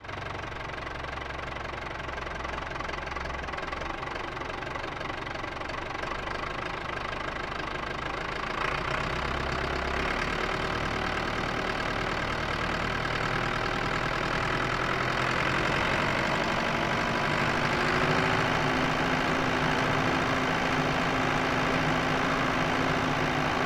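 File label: Ford Tractor - onboard - MEDIUM - Drive Various - ENGINE - Right, Ford 7700; 1979; MKH8020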